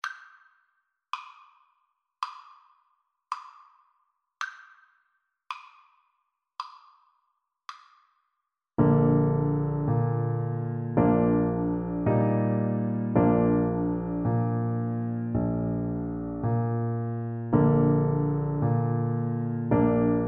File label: horn, French Horn